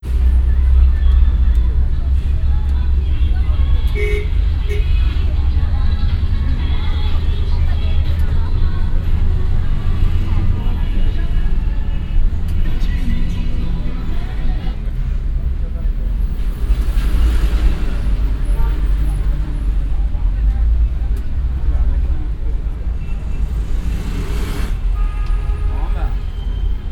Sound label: Beijing Bus Journey; Sound Scapes